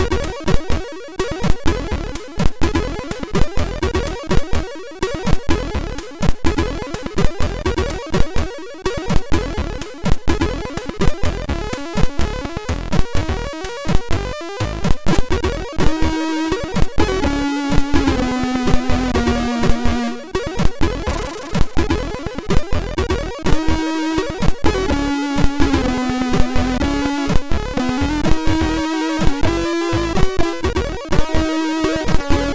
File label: sid, MOS6581; hvsc